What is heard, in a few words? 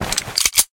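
tactical crisp reload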